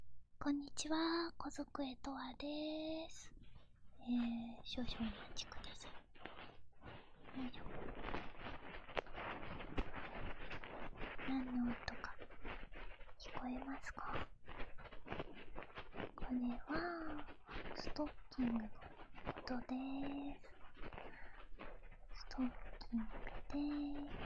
ストッキングASMR手コキ

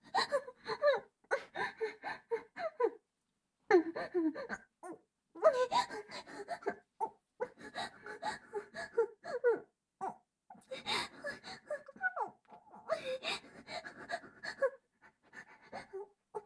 立ちバック; アドリブ集

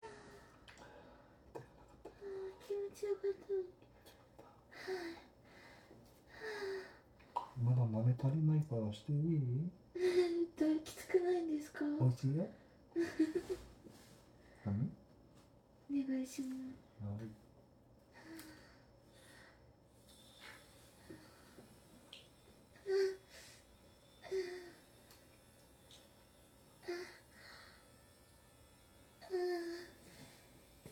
ローター クンニ 挿入前; ローター＆クンニ～挿入前 Audio Denoise

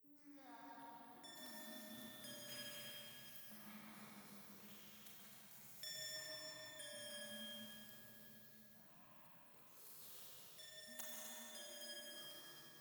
フェラチオ 寺

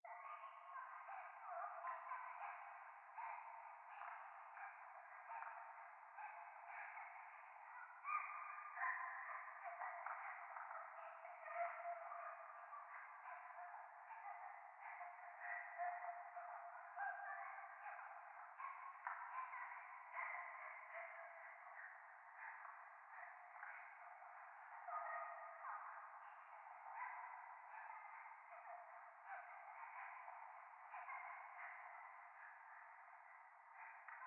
廃墟 可愛い喘ぎ 電車

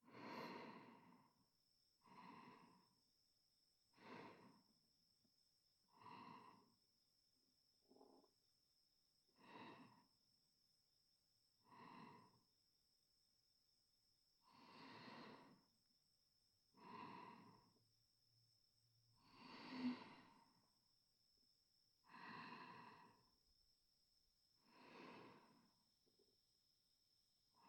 寝息 1時間ループ; Track08の後推奨; 効果音あり 射精音なし

